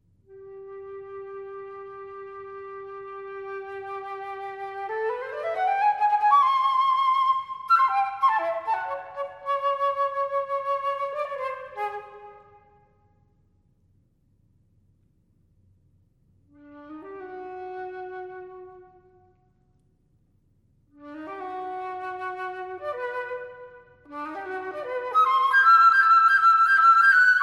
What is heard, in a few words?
Pieces For Flute Alone, Rezitativ